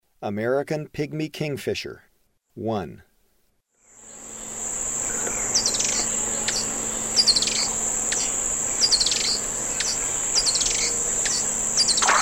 American Pygmy Kingfisher; Eastern Lowlands

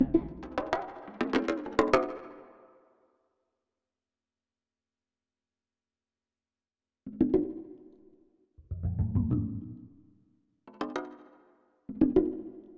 Planet Tracks, Minimal Planet Drums; Ambient Cinematic

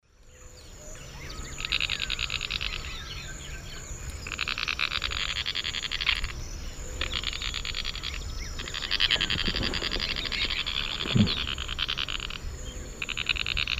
Synallaxis propinqua, calls 2 birds; S. America; Eastern Lowlands

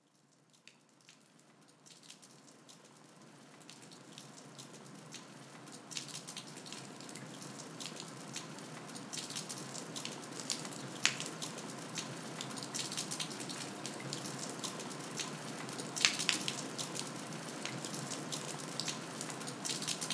Rain

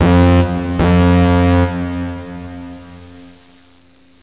FOGHORN

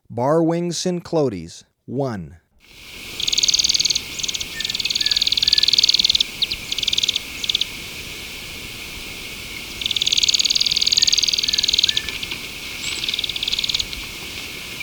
Bar-Winged Cinclodes, Birds of Ecuador; S. America

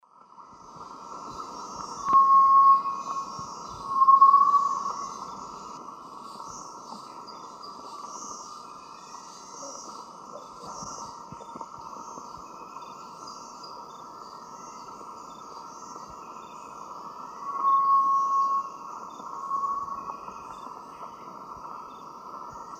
Tinamus guttatus, song; S. America; Eastern Lowlands

